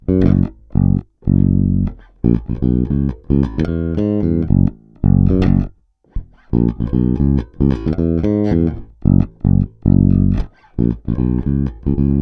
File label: Funk Mix, basses